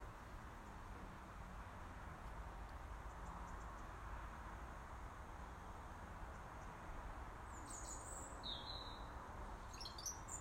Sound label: Robin Call